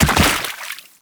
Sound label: land water, footsteps; Warframe; Teshin